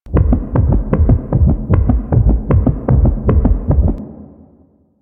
Heartbeat Loop; Ambience; Golden Maw; Warframe; The War Within

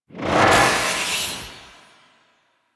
Mag Crush Cast; Warframe

